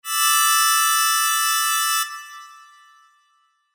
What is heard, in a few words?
Sho